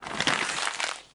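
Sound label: trash empty, Ubuntu System Sounds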